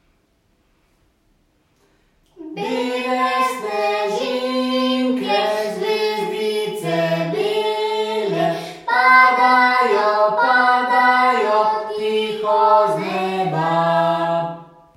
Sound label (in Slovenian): Bele snezinke vokal; Otroci, ki obiskujejo pevski zbor na podružnični šoli Budanje